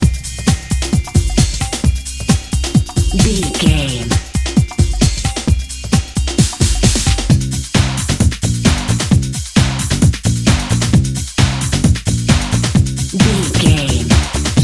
got dance, Dance, EDM, Club, Exercise, Aerobic, Aerobics, Spinning, Workout, Disco, Eurodance, Confident, Energetic, Cheerful, Happy